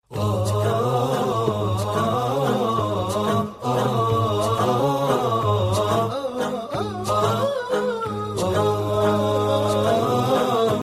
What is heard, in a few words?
islamic